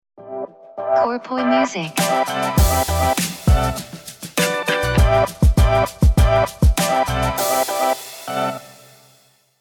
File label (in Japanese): jingle ウォーター